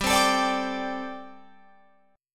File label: G Suspended 2nd Suspended 4th G, Guitar, Open F; strummed; 19,19,21,21,22,21